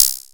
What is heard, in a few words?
Cricket D#2; EMU Orbit-3